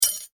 impact metal small; Materials; McKinney Sound

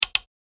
Double Click; multimedia

